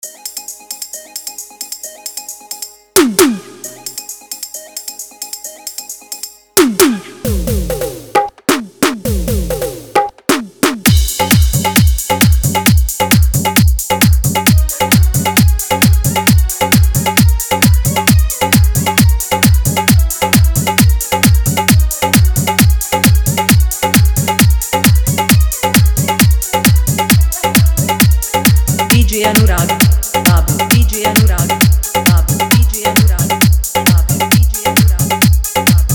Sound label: Hindi Old is Gold Hard Bass Mix